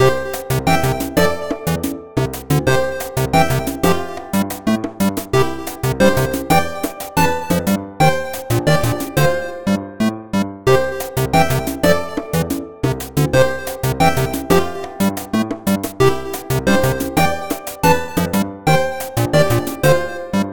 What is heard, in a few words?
Start Menu music